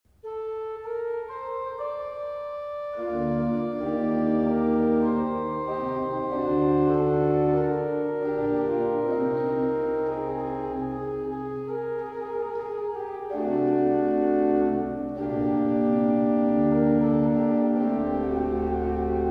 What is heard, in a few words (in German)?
Adagio in e-moll, Orgel; Bruhns